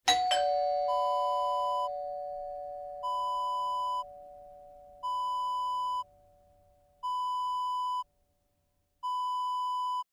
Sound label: doorbell